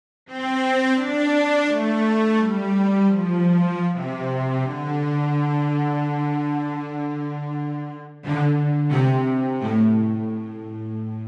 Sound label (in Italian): VCLLI legato